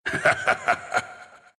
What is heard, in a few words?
laugh, ru; dota2